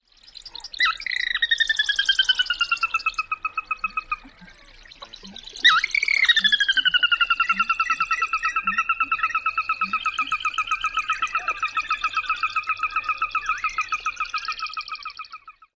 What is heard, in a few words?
whinny calls, Sora